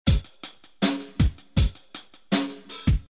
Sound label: drums